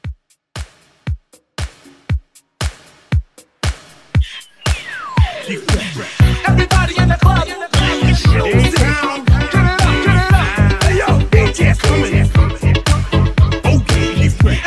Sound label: 80s Dance Hype Redrum